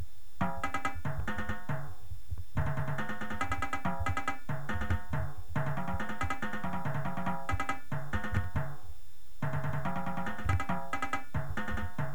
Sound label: tenors, drumline